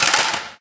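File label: physics clipboard impact small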